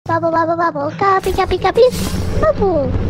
bubble bubble bubble; among us

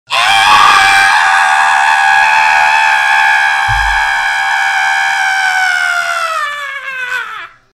toad scream

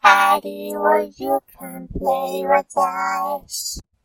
creepy thing

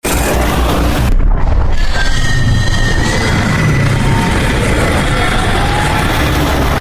mind flayer sounds

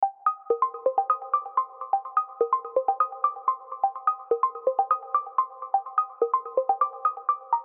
pluck loop

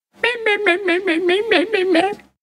goblin cry

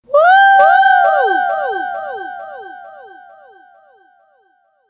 woooo

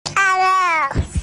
awooo

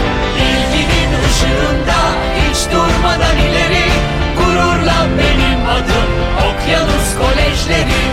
ocean march

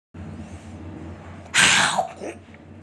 a loud bite

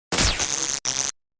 taser

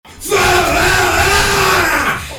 fahahahahahhhhhh

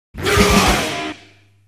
bitcoin alert; gilfoyle

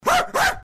little bark

